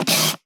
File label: Camera Single